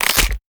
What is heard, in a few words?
Neck Snap; gamerip; The Salesman